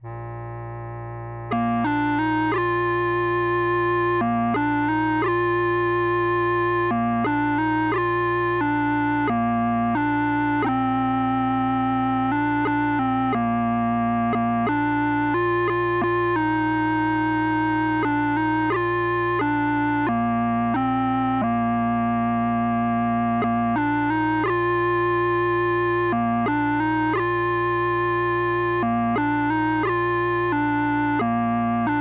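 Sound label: When the Saints Go Marchin In, pipes, 90 bpm; 4/4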